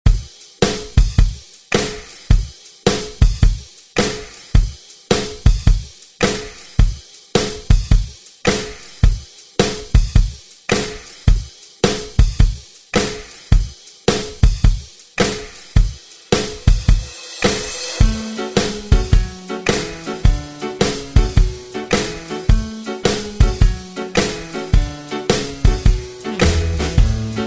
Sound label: 90s Re Drum